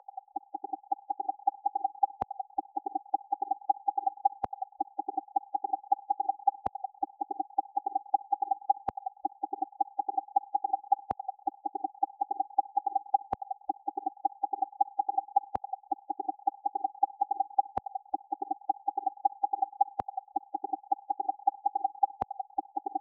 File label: Resonance Feedback Right